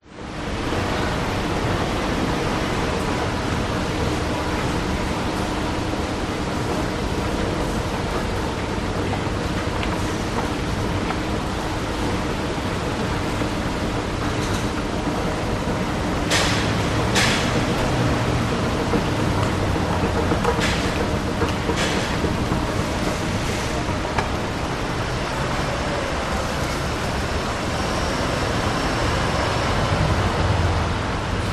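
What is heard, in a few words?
supermarket entrance escalators traffic people trolleys; public places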